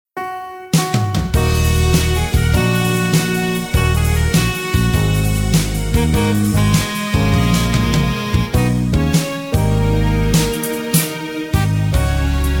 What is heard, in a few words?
(Gb To G) No Backing Vocals